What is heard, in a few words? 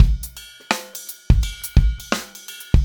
084 HipHop Live Drums Cup; Digidesign; Pro Tools; Pro Tools Essential Loops